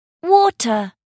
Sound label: water